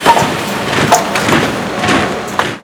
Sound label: production artillery; Hearts of Iron IV Death or Dishonor